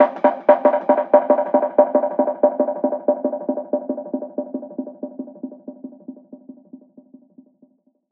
Jungle FX

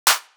Tek Clap Snare

